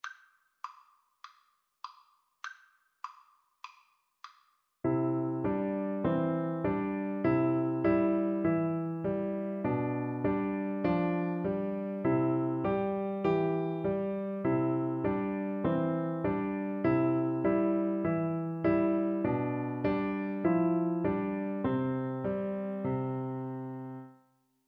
piano duet

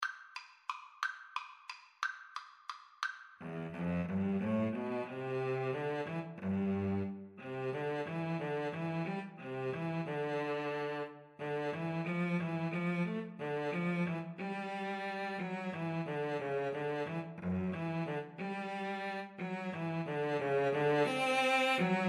Cello Duet

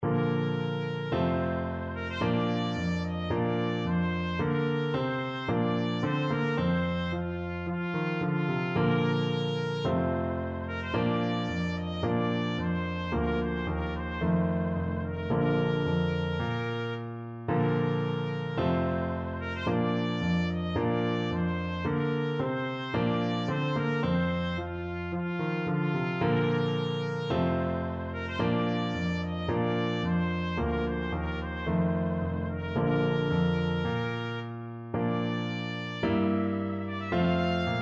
trumpet voluntary

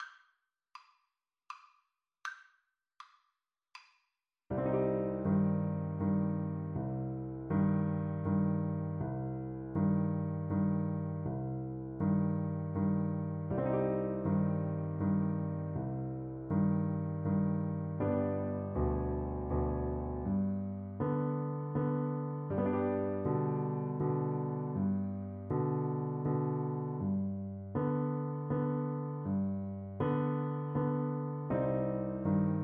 horn, French Horn

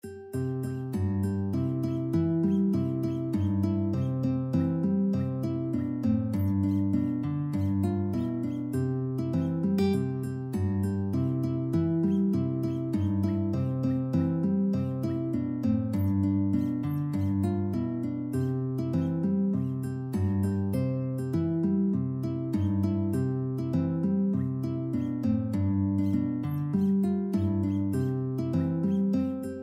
GTR

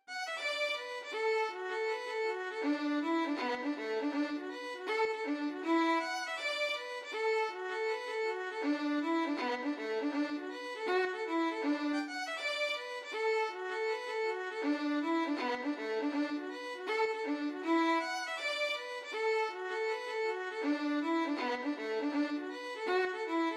violin

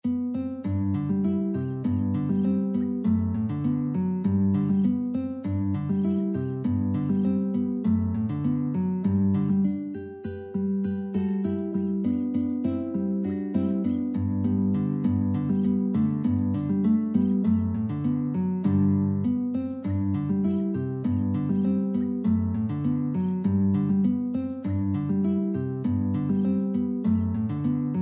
guitar

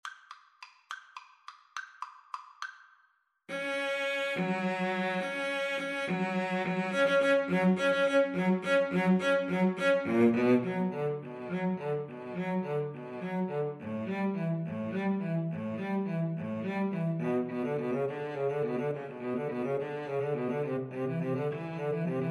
violin cello, Violin-Cello Duet; danse mac saint sa

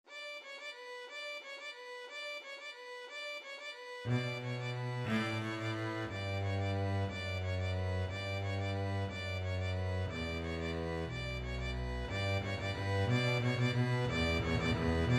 violin bass duet; carol of the bells

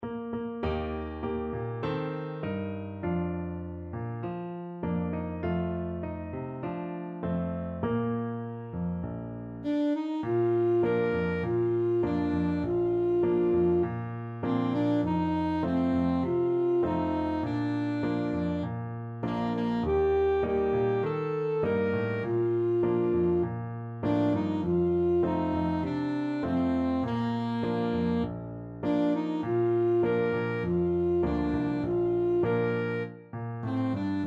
ASAX, saxophone

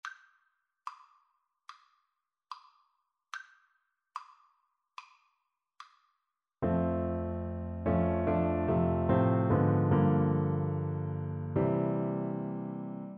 just a closer walk, Bassoon

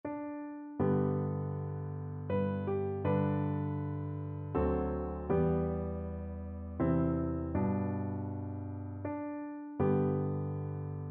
amazing grace, piano duet

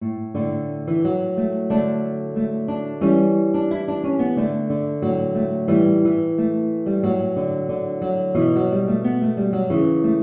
March guitar; Da Guizers

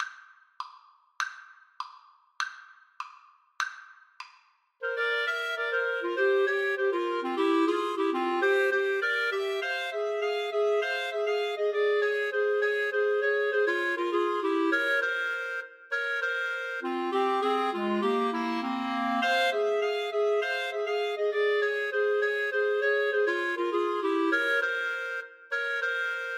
Clarinet Trio

